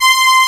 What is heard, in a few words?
ST SYNTH; AKAI S6000 CD-ROM - Volume 1; Strings; POP STRINGS